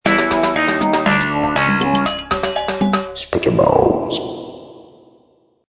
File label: Jingle (Game Over)